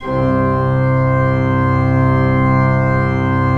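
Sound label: ORG C2, KEYS; Synclavier Sampler Library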